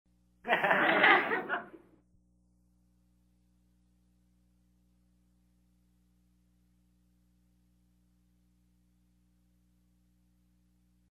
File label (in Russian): korotkiy smeh nebolshoy gruppy; Звук смеха